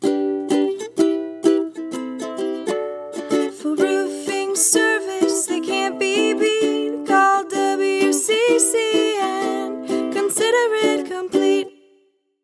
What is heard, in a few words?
Roofing Jingle; WCC